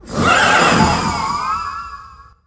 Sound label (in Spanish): Grito de Calyrex jinete espectral